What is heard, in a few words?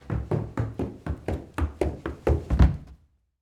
Stairs Down Wood; Footsteps; HauntedBloodlines